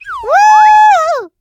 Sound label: Caramel Miss Sound; Jolly Battle; Android